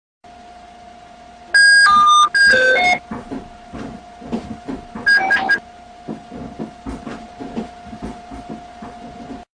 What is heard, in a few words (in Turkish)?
akbil sesi iett